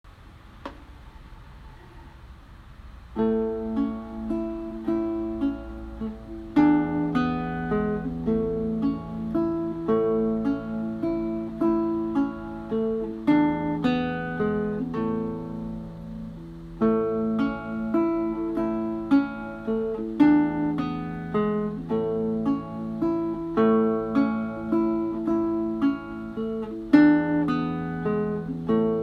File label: Waltz guitar; Carulli